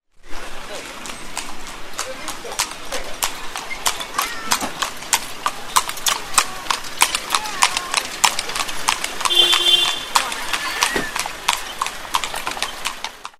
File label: nariman point, sounds of mumbai